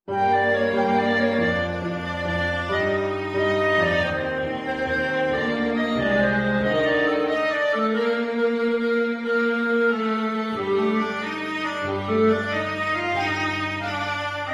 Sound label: clarinet Viola Vignette